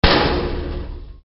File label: Metal hit; AssaultCube; Alien Stars; American Muscle Series